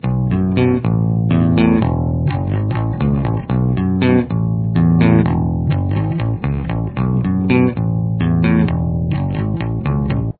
bass mix, Here is what the guitar and bass like together; Main Riff